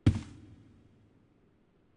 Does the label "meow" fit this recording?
No